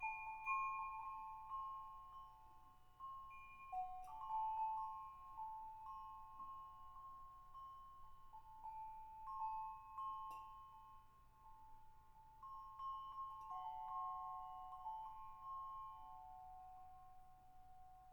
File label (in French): moutons promenade